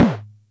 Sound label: Music Percussion Computer Tom, Drum Machines